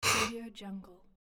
scared man breath

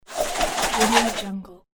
kung fu spinning weapon

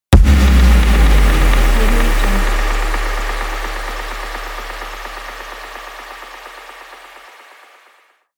explosion

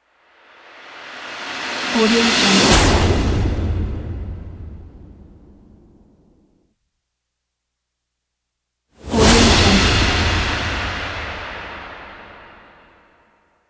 cymbals

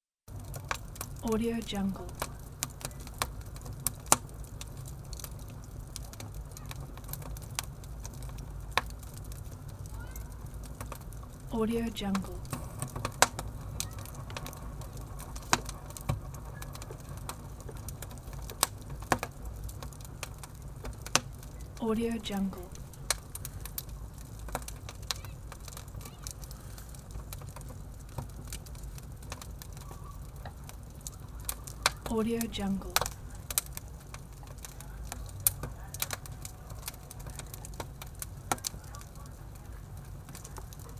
campfire crackling

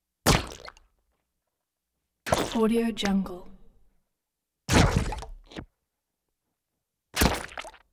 comic weapon blast liquid burst squirt drip

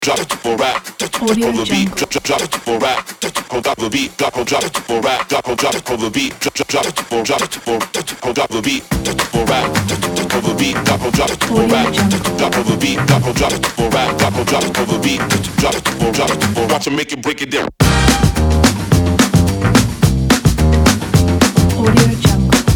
upbeat and funky beats pack

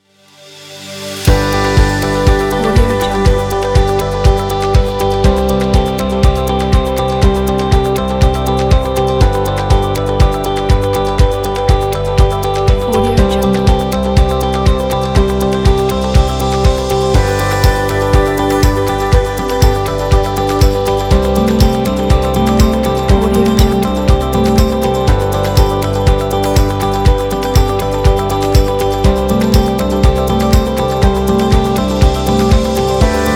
happy indie folk

